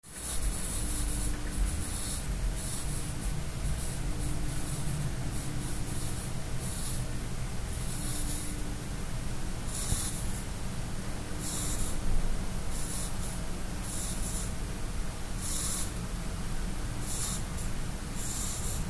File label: Rocket R58 steam boiler